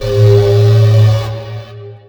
Scifi Alarm Loop; SFX Kit; Sci-Fi Loops

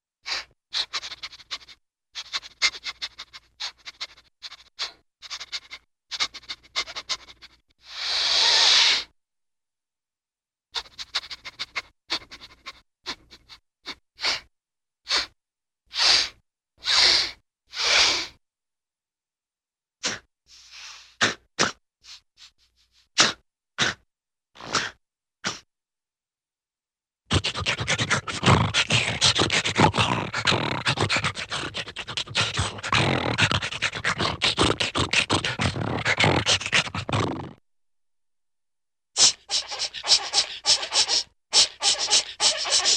Dog Sniffs Snorts slobbers; Cartoon; Hollywood Edge; Cartoon Trax

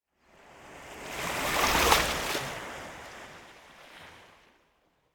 Surf Water Lap Small Wave On The Coast; Hollywood Edge; sound designer's toolkit v2

